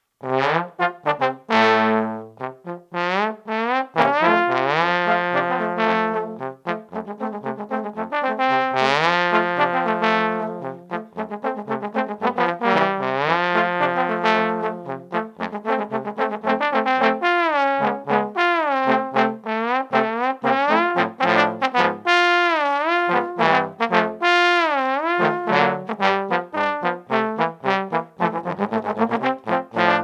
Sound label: trombone